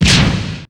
skill sword wind; FlyForInsanity

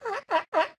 walrus appear medium; Candy Crush Friends

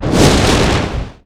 Skill strong wind; FlyForInsanity

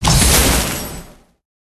line blast; Candy Crush Friends

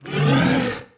death; FlyForInsanity

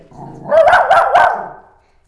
cairn terrier bark

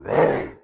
lizard; FlyForInsanity

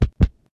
heartmode heartbeat; Candy Crush Friends

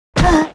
harpy dmg Die; Fly For Insanity

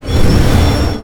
Clockworks Entry; FlyForInsanity

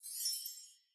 starshine effect; Candy Crush Friends

